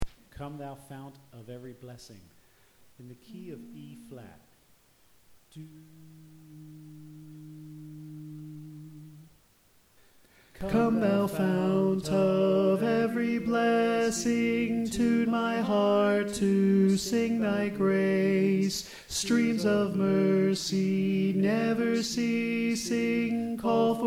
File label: Tenor